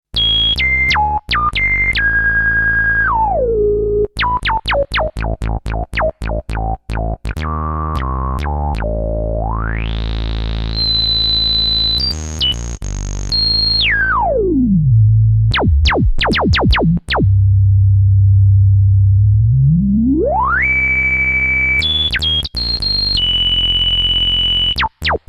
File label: Resofilter